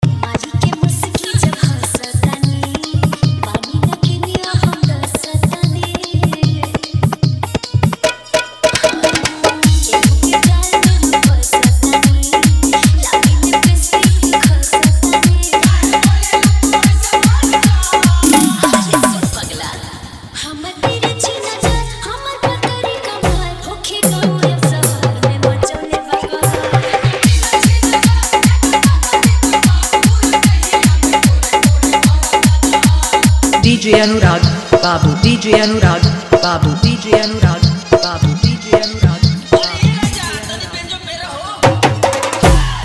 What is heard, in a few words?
Hard Dhollki Bass Mix